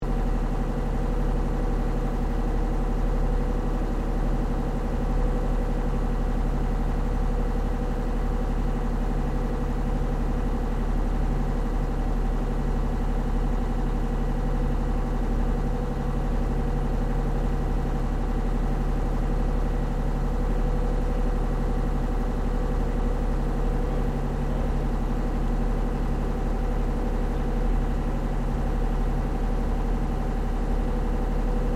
BOAT WATER TAXI LARGE ENGINE LOOP, Large river water taxi; Williamstown; Boats (Sound Effects:Vehicles)